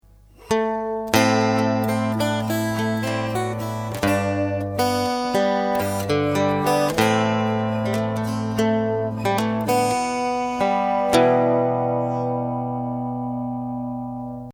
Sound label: Greensleeves tone